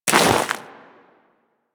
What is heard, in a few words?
Step Ice, Footsteps; Warframe; Corpus; Crewman